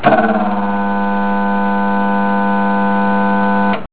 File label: turn on, power conditioner